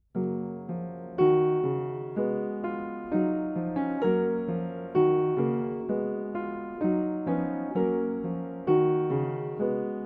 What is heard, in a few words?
Section G Accompaniment